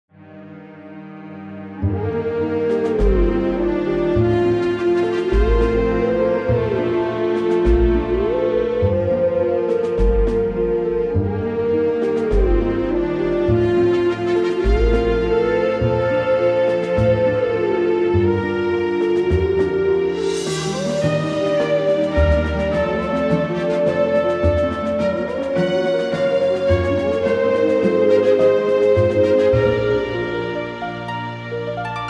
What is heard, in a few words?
Howling Stone - (Duo); Legend of Zelda, The : Twilight Princess